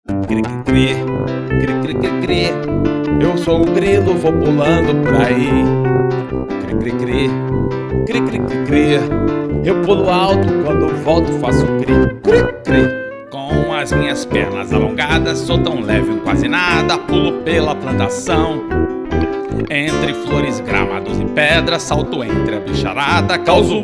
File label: Cri cri cri cri; Extract from CD 4 Track 4